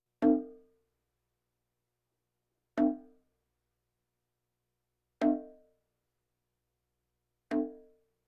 Percussion Bongos Tympani rolls Gongs Drums

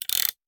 Hand Gun Foley; Sound Ideas; Guns

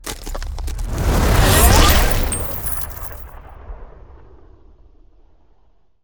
Ice Spell Mobility Teleportation; Ice Bridge; Arctic Aura; Mobility & Teleportations

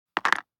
DICE on Cardboard, Drop, Standard, Six Dice; Samples; ArticulatedSounds; DICE Sfx Party (Extended)

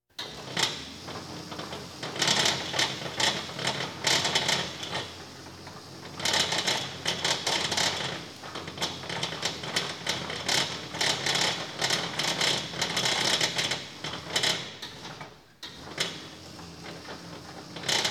Police, Fire engines

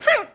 Boxing Female Punch Breath

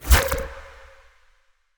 Ice Spell Utility Detect Magic; Utility Spells; Arctic Aura

